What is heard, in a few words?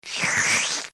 bruitages eating a banana